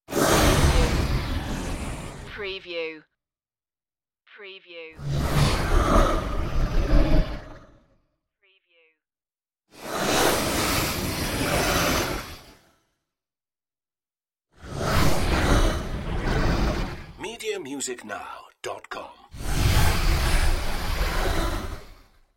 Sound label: fire breath whooshes